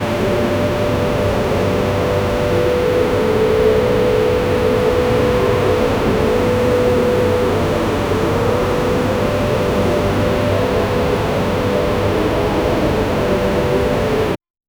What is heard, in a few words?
ambience sound of a big, breath